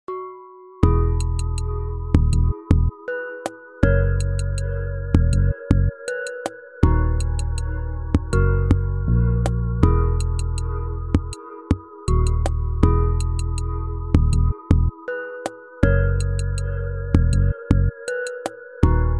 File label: Melody; Animal Spa